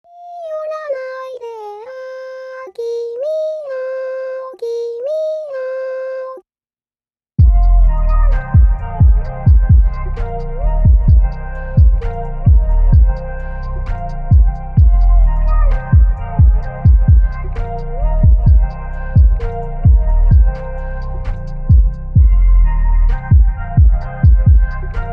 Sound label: Anime Phonk